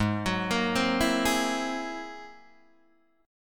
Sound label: G sharp Augmented 9th G sharp Guitar Open D